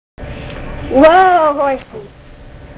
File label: horsey